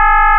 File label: Ringback 425Hzx25Hz; Microsoft Lumia-550